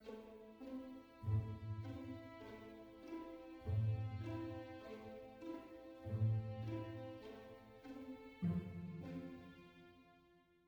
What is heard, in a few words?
adventure soft start; Quivr; PS4 Windows gamerip 2016